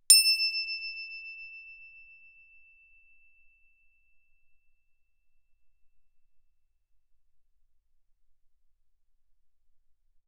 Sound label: crotale E7 ff; Percussion; crotales